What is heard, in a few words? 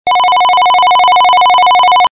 Ring Tones supplied with the 8180 SIP Audio Alerter; Algo; Bellen